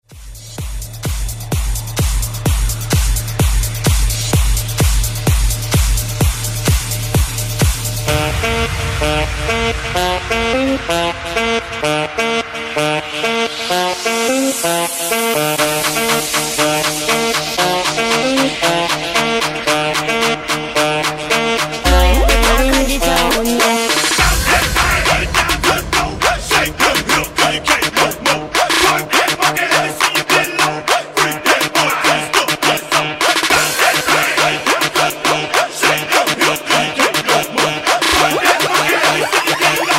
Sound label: Twerk EDM; Bootleg